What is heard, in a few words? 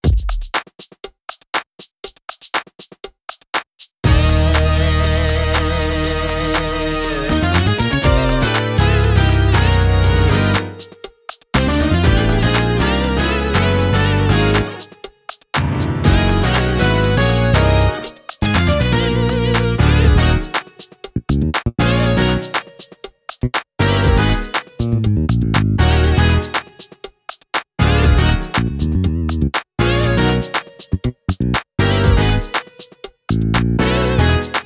drumless